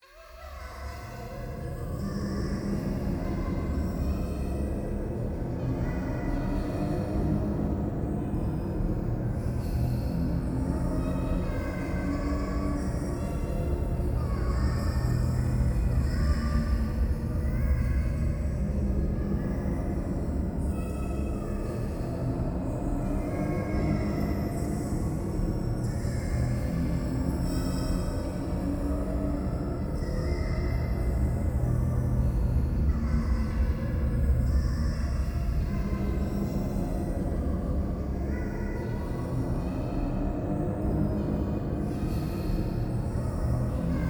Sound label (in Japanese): ゴースト 可愛い喘ぎ 車の中